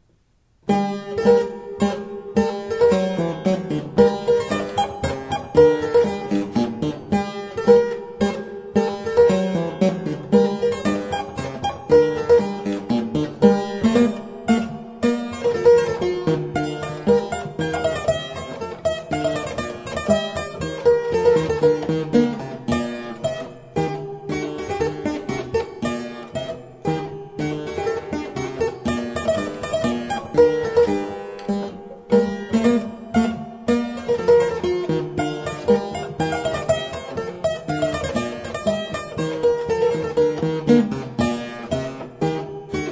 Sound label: polonaise in g minor; cpe bach